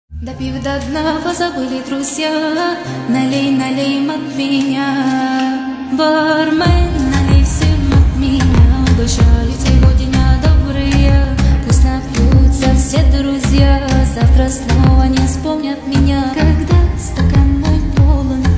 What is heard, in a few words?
3D Bass Boosted